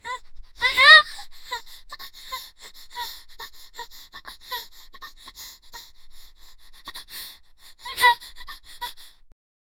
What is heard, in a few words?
a young child girl breathi